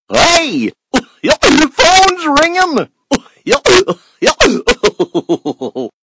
Goofy Funny

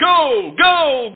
Gol Gol

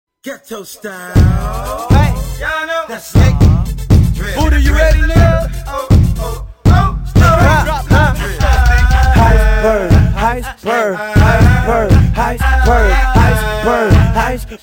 Drill Remix

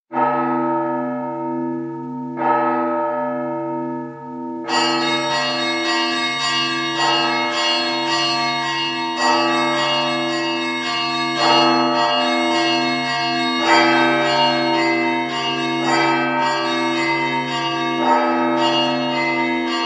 Orthodox Bells

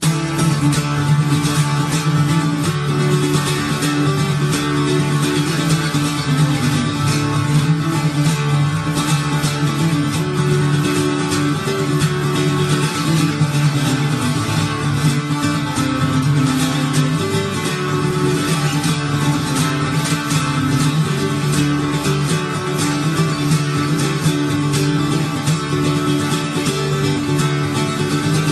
The Guitar

